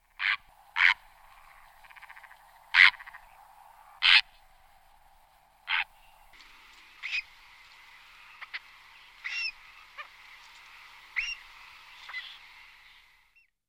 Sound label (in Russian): caplia